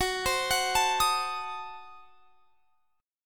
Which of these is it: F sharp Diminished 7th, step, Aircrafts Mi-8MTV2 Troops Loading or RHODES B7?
F sharp Diminished 7th